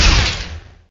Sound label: crylink impact, weapons